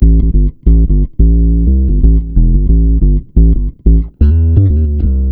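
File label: A minor, Bass, 90 BPM; Sampleheads; Ultra Freakin' Bass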